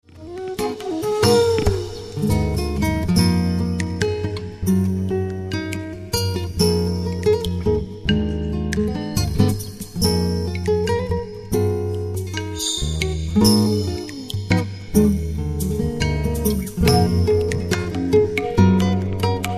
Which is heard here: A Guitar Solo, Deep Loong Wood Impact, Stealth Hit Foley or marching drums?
A Guitar Solo